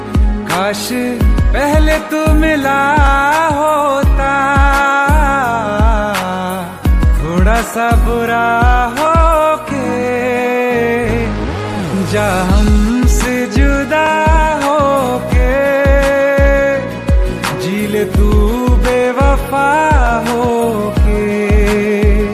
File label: Sad Song Ringtone; ringtones